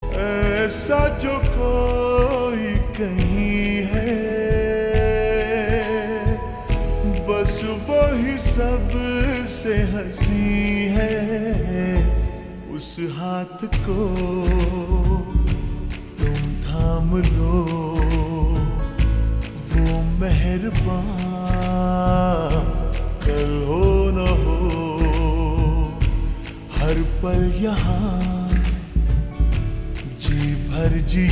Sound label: Ringtone slowed and reverb